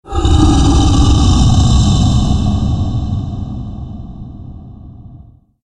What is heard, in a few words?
danger growl ambient